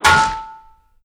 tink

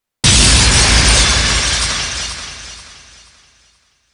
bomb

